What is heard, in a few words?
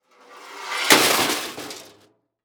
Impact Sliding Crash Car Crash